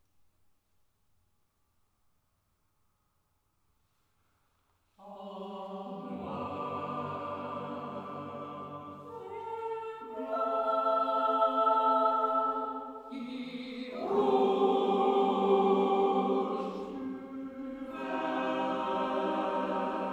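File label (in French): chœur mixte à quatre voix SATB, Enregistrement live à l'Eglise Romane; Durée : env 4min15; Enregistrement live à la Création à l'Eglise Romane de St-Sulpice, VD; Voyelles; Création : Ensemble Vocal Amaryllis, nov24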